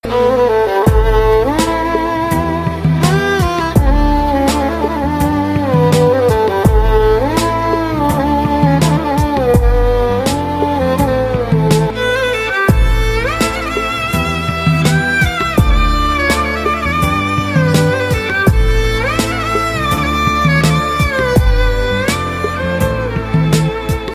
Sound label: instrumental tone ringtone; telugu; nannaku prematho